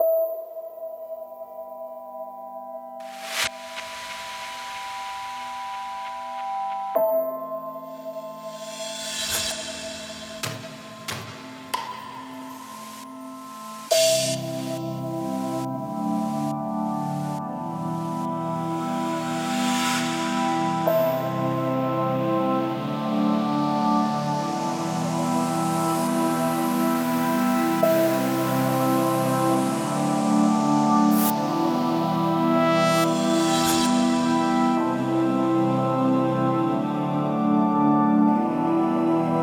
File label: Ambient